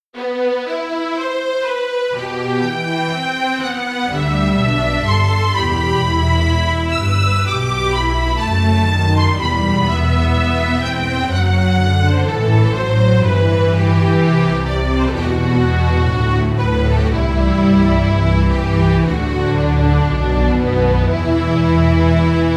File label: Violins Cellos and Basses Simple